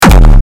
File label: RAWSTYLE KICK (D#)